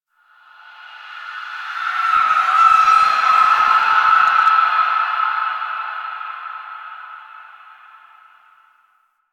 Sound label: Memory Echoes; Warframe; Quests; TheNewWar; Ambience; Zariman